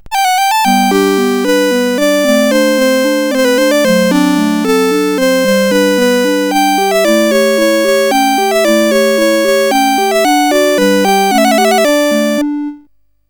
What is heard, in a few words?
menu, CSG8580R5; Videobasic